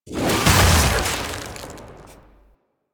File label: Fire Blast; Warframe; Ember